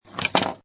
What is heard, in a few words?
Pinball Sound Ball Slide Then Land And Clank; Video Game Sound Effects